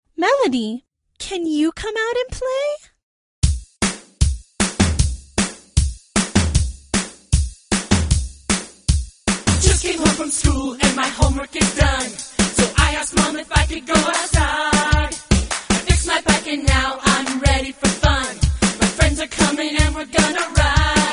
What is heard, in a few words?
melody